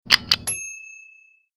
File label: gun cock